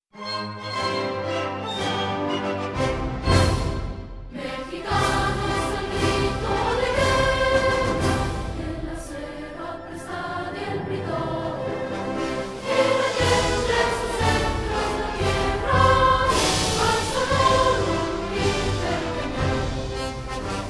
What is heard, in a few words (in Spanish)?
himno nacional; Himno Nacional Mexicano